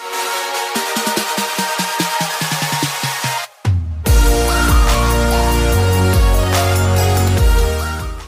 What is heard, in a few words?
funny outro song